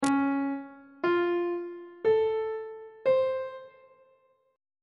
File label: d flat augmented major 7th chord intervals on treble clef